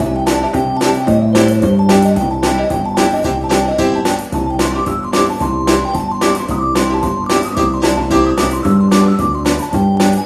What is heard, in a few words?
Restaurant Muzak; Postal 2 Eternal Damnation